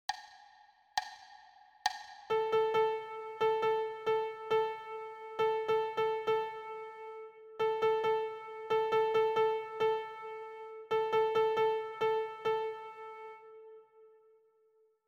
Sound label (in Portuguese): Ditado ritmico 34 completo